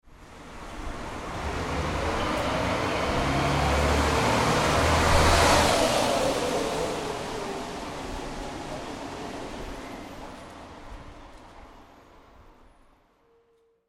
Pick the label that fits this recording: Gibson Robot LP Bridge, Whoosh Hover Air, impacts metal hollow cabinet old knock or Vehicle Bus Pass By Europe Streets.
Vehicle Bus Pass By Europe Streets